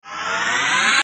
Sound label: Powerup; Quake2